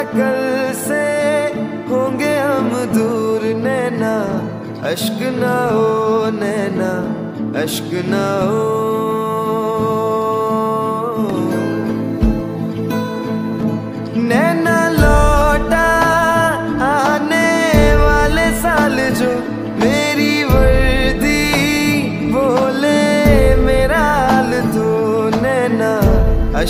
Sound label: Desh Bhakti Song Ringtone